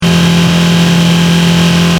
Engine: High Revs Loop, car; Cars; Vehicles; Stuart Duffield

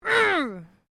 FEMALE OUCH; Female vocal sounds; Pain (Sound Effects:Voice)

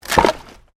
WOOD PLANK BREAK

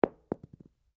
Cartridge 38 super impact carpet, shell casings; shell casings of different calibers impacting on different surfaces

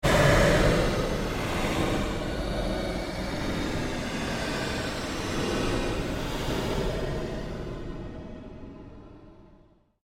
Created Pulse Long Sweep, Sweeper Sound Effects; Sound effects created by manipulating other sounds

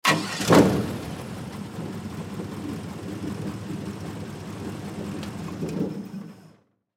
Air Boat On Off Short; Transportation Watercraft; Boat Sound Effects; Blastwave

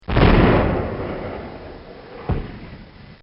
Howitzer Gun Blast; Blasts; Impacts; Bobby Cole

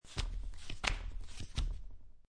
Playing Cards Dealing Three Cards Flop; Sound Effects:Casinos

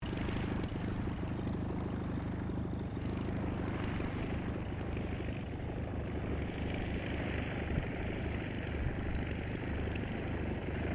AIRCRAFT RYAN STM-S2 TAXI; 1939 Ryan STM; Airplane; Airplanes; Vehicles

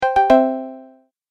Message Alert Sound, musical

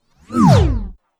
Tired Laser Dj Swoop Whoosh

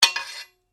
Blade Lock Scrape, Sword; Foley sounds for melee weapons; Swords; Combat

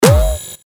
Hit Bass Digital Distortion; Production Element Imaging Element Accent Transition

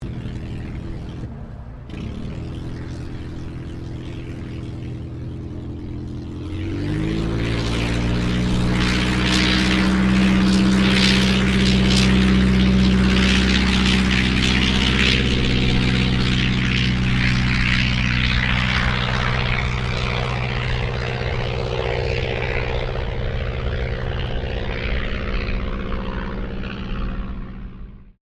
AIRCRAFT DH82A DEHAVILAND TIGERMOTH TAKEOFF; RAAF Tigermoth; Airplanes

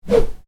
Swish small, Swish: Short, Light; Whooshes; Sound Design; Stuart Duffield

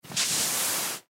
Clothes Hoodie Lift Sleeve; Dressing; Foley; Blastwave

